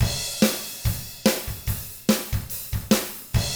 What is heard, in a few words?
ROCK, Double Platinum Drums